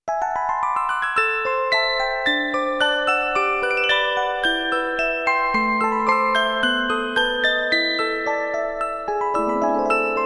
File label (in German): Orgel